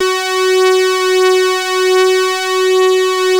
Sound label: BROOMSTICK; Masterbits; Vintage Voltage